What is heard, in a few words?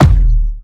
Player Head Collide